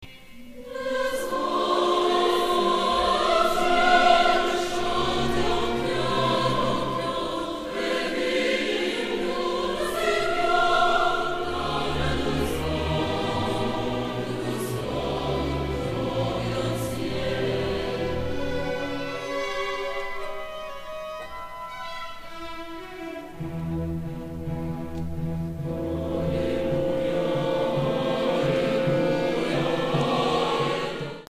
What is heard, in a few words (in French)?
Choeur anges